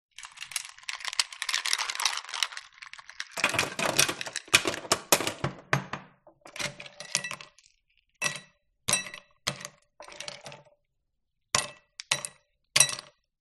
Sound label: Ice Cube Sounds